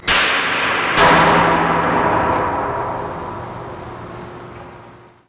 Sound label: Hit, Earth & Steel